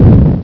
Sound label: poof